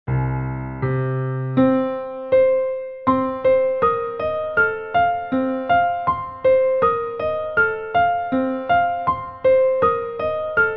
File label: Madrigals accompaniment; Three Madrigals 2 Take oh take those lips away